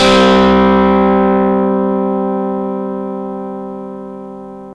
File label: steel guitar slight distorted; instruments; lmms